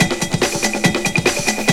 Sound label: TRIBAL 2, DIRTY DR. LPS